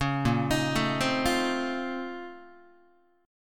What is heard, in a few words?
A sharp Minor Major 11th, Guitar; arpeggiated; 4,6,6,7,4,4